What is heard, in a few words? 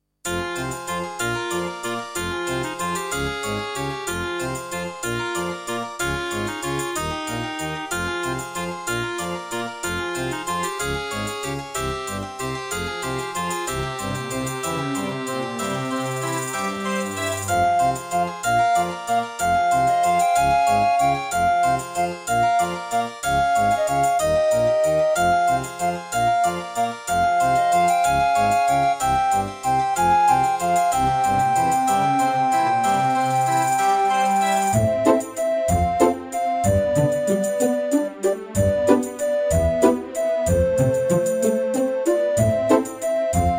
Track 6, Yamaha DB51XG GS MODE; Death Gate